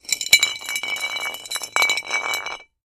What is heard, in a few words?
Glass Bottle Roll On; bottles